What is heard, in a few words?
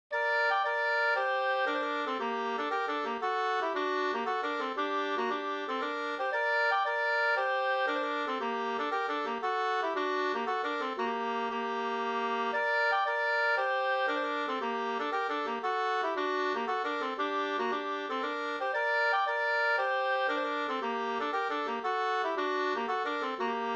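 polska, two-part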